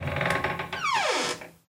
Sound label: door old wooden squeak